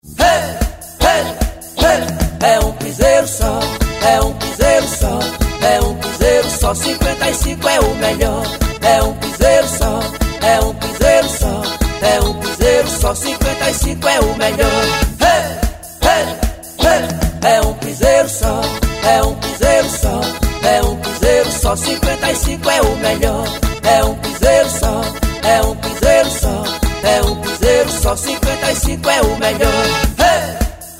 musica de politica piseiro

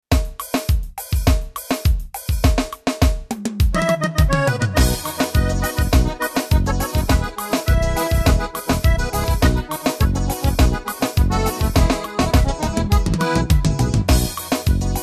ritmos yamaha forró

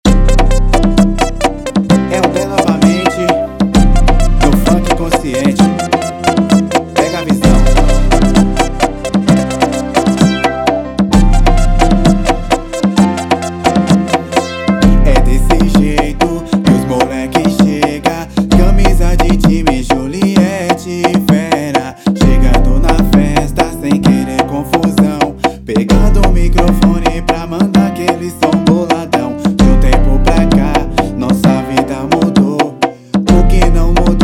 funk consciente

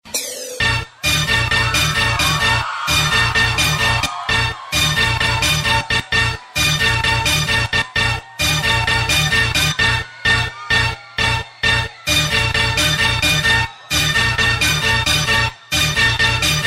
funk; jg music

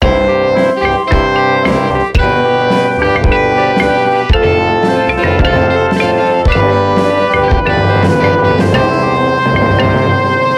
orquestra e som 10 segundos abertura

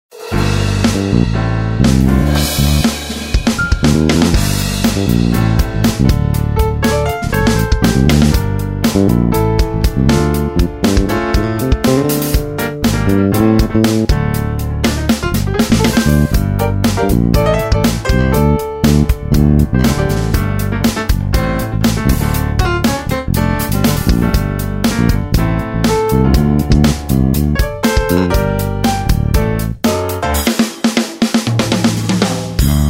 piano baixo

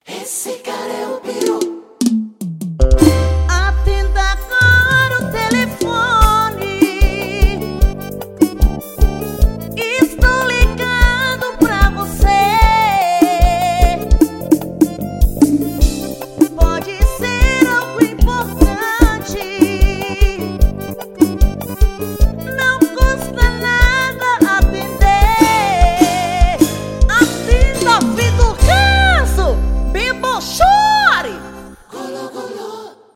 toque

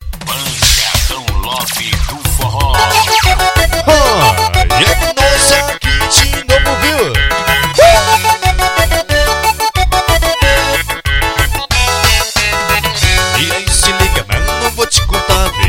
forro

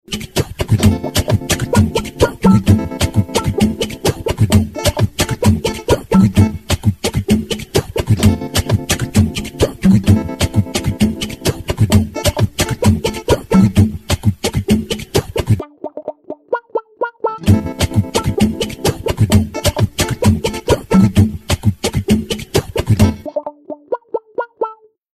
trilha guitar funk

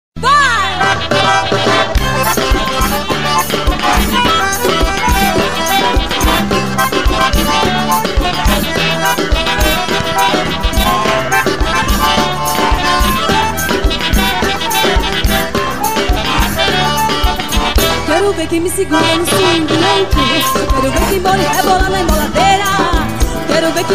swing louco forró

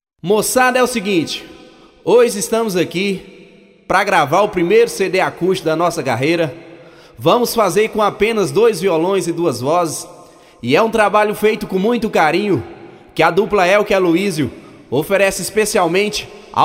abertura acústico

